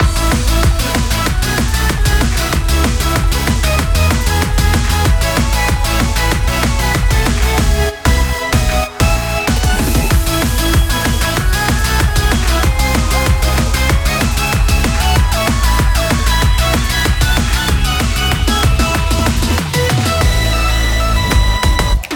Battle; Astral Party Demo

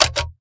Switch

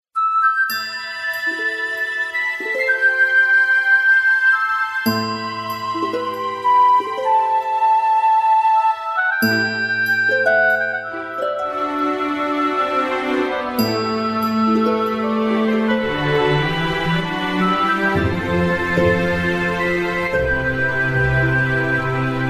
bgm

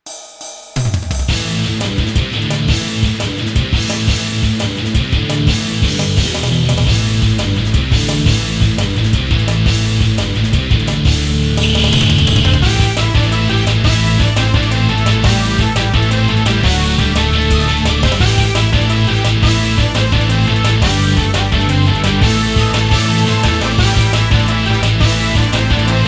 bgm

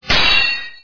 pickaxe

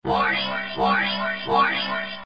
warning

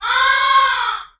Crow

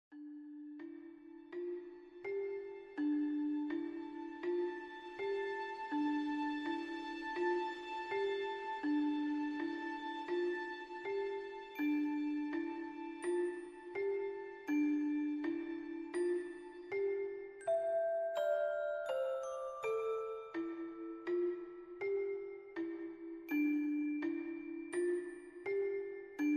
bgm

